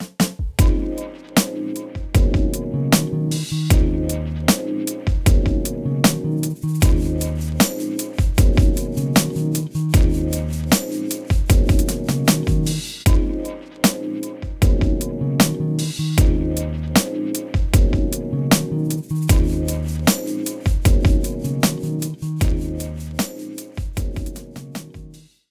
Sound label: Rap Loop; The Jackbox Party Pack 5; Jackbox Games